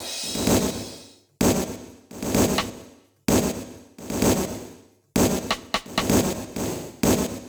Drum Loop 128bpm

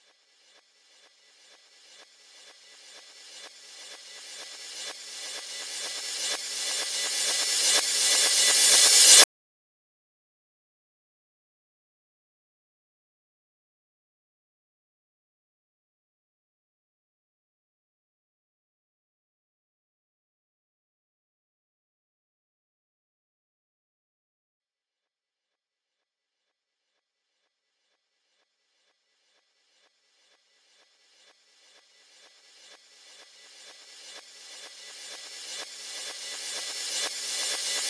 Reverse Cymbal 125

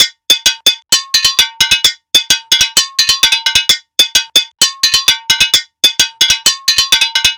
percussion loop macumbinha 130; TROPKILLAZ

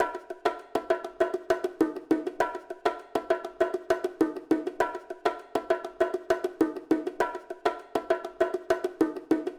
Congas Em 100 bpm

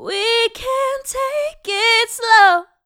vocal phrase slow dry 110 Fmaj

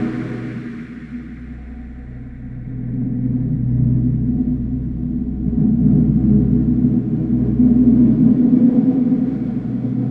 Atmos Loop Deep Windy Atmos FX 105

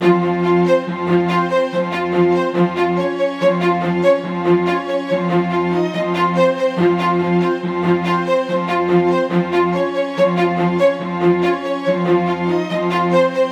Strings 142bpm Fm Ensemble Trap

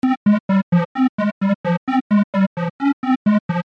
Flute Synth

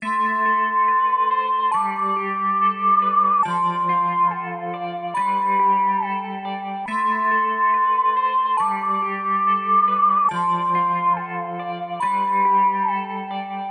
Melody Loop Amin 140bpm

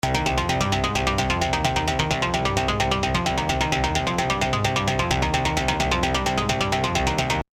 Stab; Queen

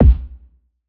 Kick

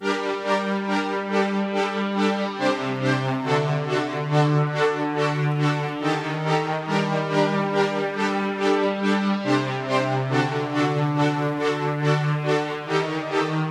Melody Loop Gmin 140BPM; Scarface

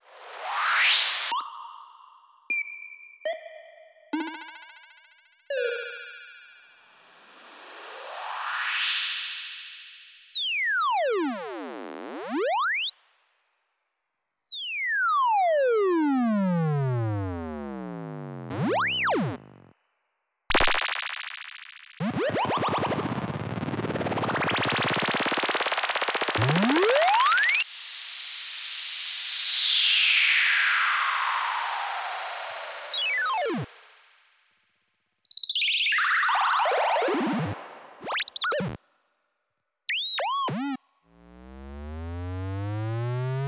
Deep Space Textures

